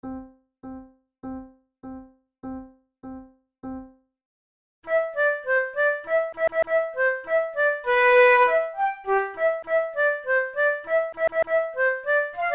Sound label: waltz duet, flute; philadelphia hop